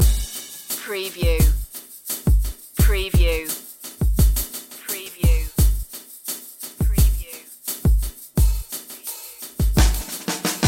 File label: lounge living loop